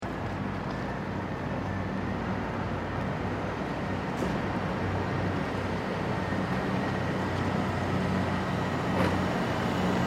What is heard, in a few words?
Street noise close to traffic, Trucks Pass Calm Enviroment; Close recording of trucks that stop and start in queue. Exhaust pipes and mo; Traffic And Car Sound Effects